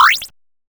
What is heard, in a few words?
retro powerup